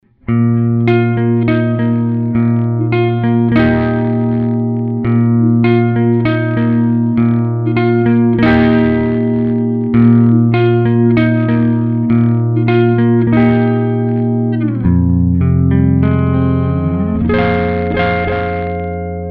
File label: british clean